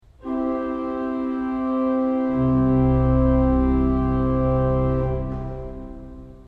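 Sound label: zbirnik mezzoforte, organ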